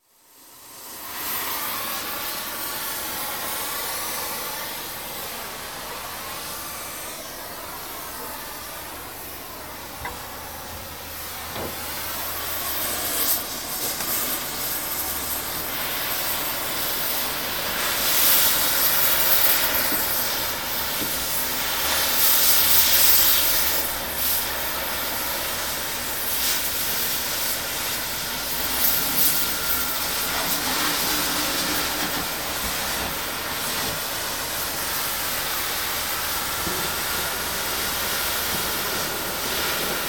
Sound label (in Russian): Gazosvarka